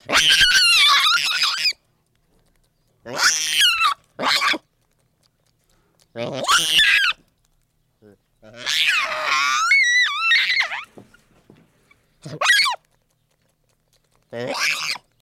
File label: Baboon Screams Wails